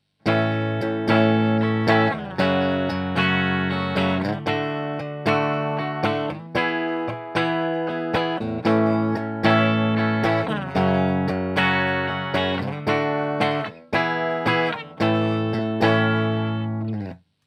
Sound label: JC120, guitar